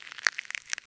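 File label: resource completely harvested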